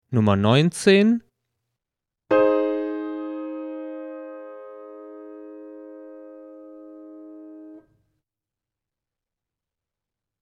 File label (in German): Dreiklangs Unkehrungen